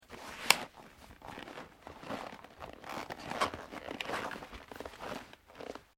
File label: SKI BOOT PUT ON; Snow Skiing; Skiing and Snowboarding (Sound Effects:Sports)